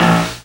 percussive noise bass; Sonic Foundry (Sony Creative Software); Ugly Remnants VOL-2; Basstuff

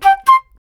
flute, D brass licks

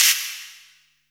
Echo Clap; Zero-G; Soundclash

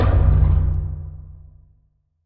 aether drum; Sonic Foundry (Sony Creative Software); Ugly Remnants VOL-2; Beatstuff

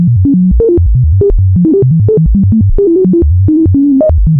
ARP2600, modular syn; Sonic Reality; Vintage Timetraveler

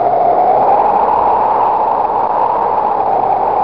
wind; ST-XX Amiga Soundtracker Sample Packs

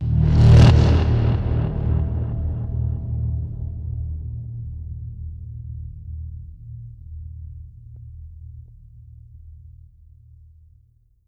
back bass; Q Up Arts; Denny Jaeger Private Collection

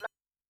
IND bells; Yamaha DTXpress-II

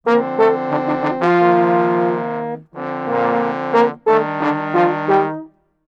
90 Dmn7Cmn7Dmn all, Brass; 90 s Sample CDs; PowerFX - Downtown Orchestra

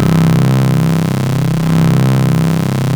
OB C2, Rare Synthesizer; Hollywood Edge - Giorgio Moroder Rare Synthesizer Collection; OB 4 VOX

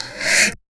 Deep Breath; Zero-G; Soundclash Nu Dance Electro; Acidized Wavs; FX Atmospheres

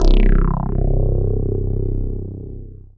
Dance Floor Bass, Multisample Basses

